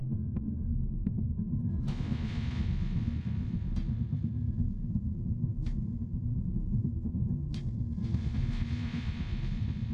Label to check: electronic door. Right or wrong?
Wrong